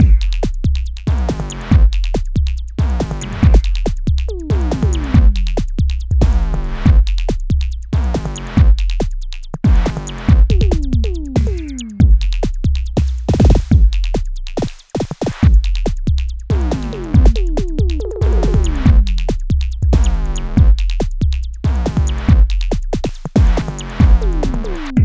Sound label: Beat, Drums, Eclectic Electronica